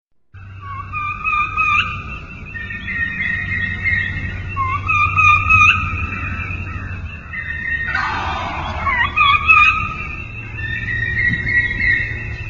Turkey Kee Kee

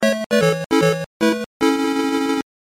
Game Over

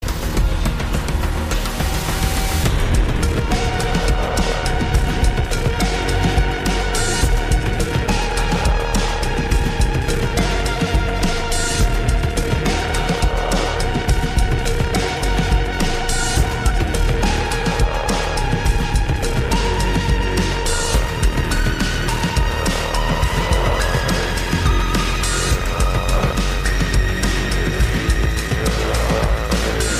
Drop

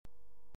Silence

